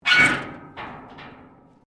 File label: METAL RUSTY SCRAPE